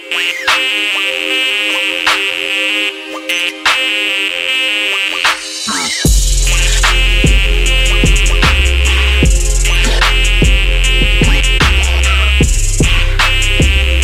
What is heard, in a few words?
Trap